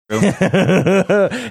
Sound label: funny laugh